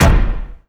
untick, ui

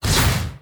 ranged attack; terrorblade

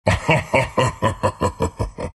treant laugh; dota

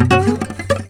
missed beat; weapons; dota; largo

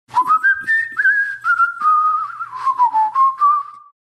announcer whistling two; announcer dlc pflax

